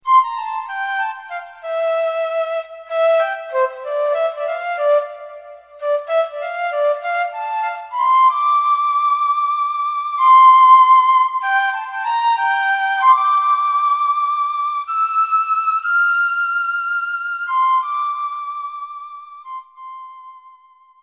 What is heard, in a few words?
instrument